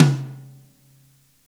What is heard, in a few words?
hi TOM hard, Drum Kits; Big Fish Audio - DOM; Redrum